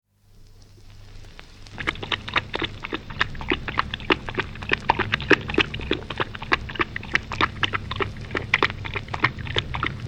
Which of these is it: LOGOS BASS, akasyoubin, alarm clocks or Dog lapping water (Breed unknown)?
Dog lapping water (Breed unknown)